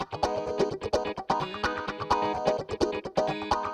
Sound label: Electric Guitar - G# min, 128BPM; Vengeance Samples; VEH3 Electric Guitar Kit 2